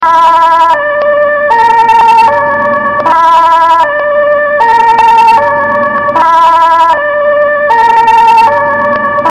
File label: Dutch Ambulance